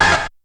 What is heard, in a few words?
MISC, Skanky Perc; E-MU Formula 4000 Series Vol. 1; Hip Hop Nation